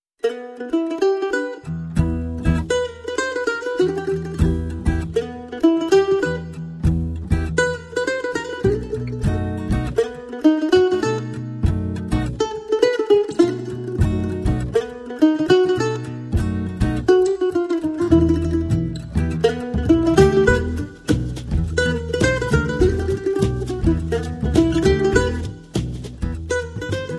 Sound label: tango